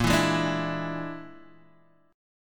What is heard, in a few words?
Bb 7th Flat 5th Bb, Guitar Drop D; Bb7b5; strummed; JGuitar